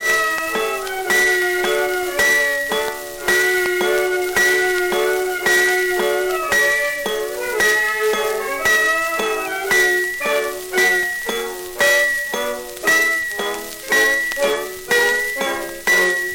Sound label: Anvil chorus, jukebox opera